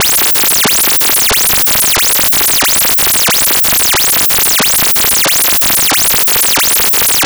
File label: hp sweep